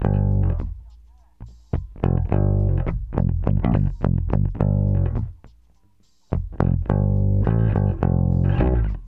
Bass, RnB

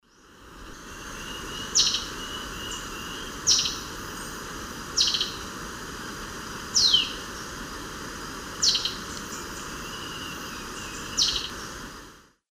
Lanio fulvus, alarm call and call; S. America; Eastern Lowlands

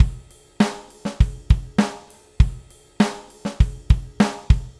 Basic Beat, Rock Drums

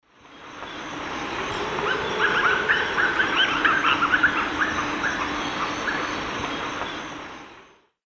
Lurocalis semitorquatus, calls; S. America; Eastern Lowlands

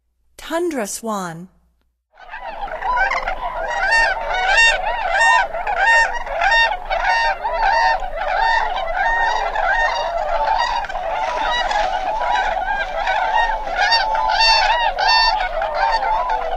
Tundra Swan; North America; Stokes

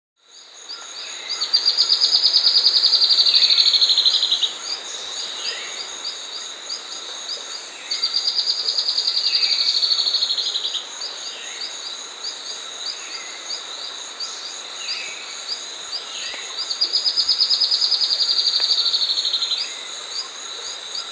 Spot-Winged Antbird; Percnostola Lea; S. America

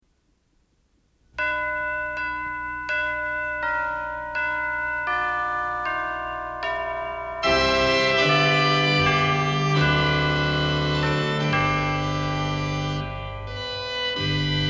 Christmas Organ & Chimes

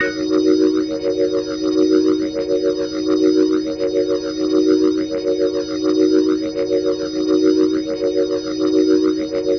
Glowing Organ D# Min7, Rock, Organ Licks

